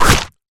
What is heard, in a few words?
Easter Clover Destroyed; fowl; Easter Blocks